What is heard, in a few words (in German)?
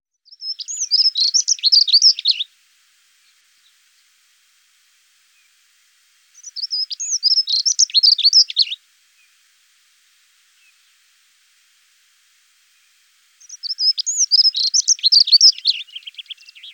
Heckenbraunelle